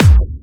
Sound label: Bassdrums Clubby Kicks; Vengeance Samples